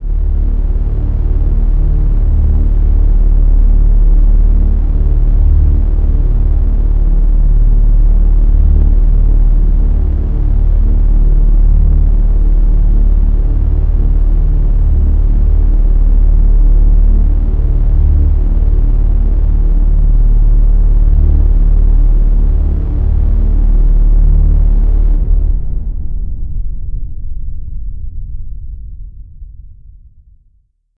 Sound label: Tension; will you snail 2022 pc